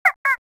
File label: camera shutter; LG; A230